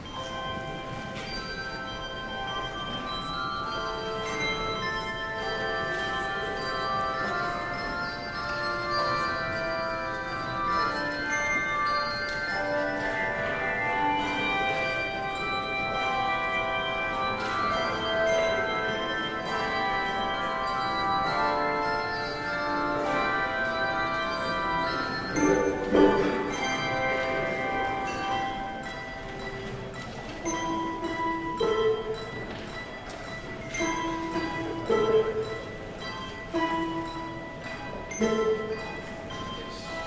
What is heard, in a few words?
Handbells; O Morning Star